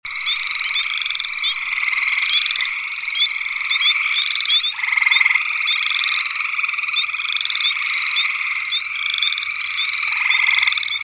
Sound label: Frog call chorus